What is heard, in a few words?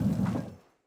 stone door small